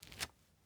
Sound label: masonry jump